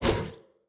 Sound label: whip swing; smite; osiris